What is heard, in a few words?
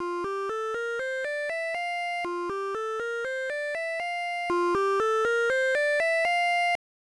Stereo Test